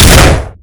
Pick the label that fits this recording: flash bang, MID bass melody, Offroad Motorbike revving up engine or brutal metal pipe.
flash bang